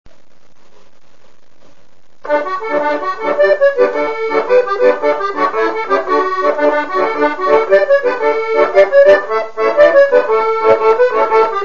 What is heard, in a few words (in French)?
musette, accordeon